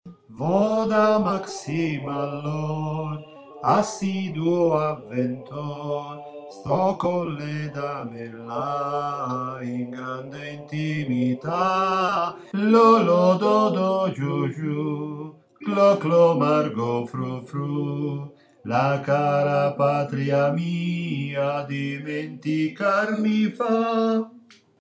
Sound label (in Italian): operetta alti; operetta 09 alti